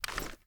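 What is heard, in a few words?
drop movement; gmod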